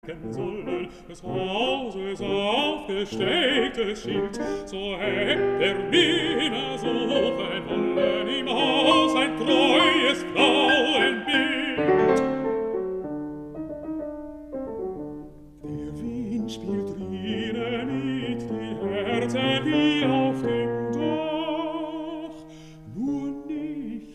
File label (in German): Vokalmusik